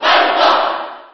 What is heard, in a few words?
Falco Cheer JP; SSB4